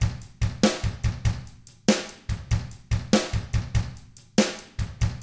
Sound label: ROCK 6/8, Drums; ILIO; Double Platinum Drums 1